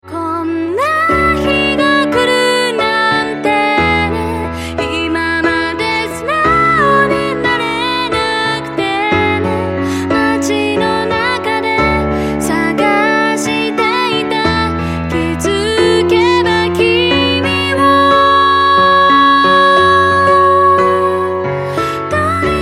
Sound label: piano